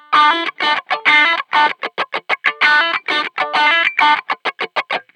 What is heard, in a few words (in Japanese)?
カッティングギター ワウ